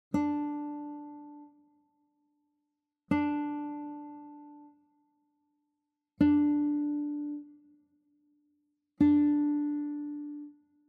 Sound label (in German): Verschiedene Saiten; PoeticGuitars 2